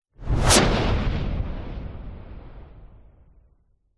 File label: sound design whoosh short fast powerful crack long tail; zapsplat